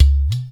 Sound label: udu, percussion; InVision Interactive; Percussion Slam